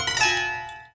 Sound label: Prepared Piano; Battery 3 Sample Kits